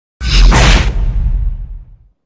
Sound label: predator mortar